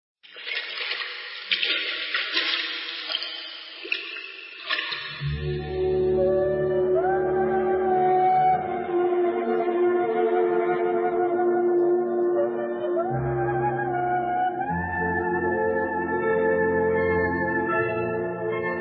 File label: bubbles (extracting au from h2o)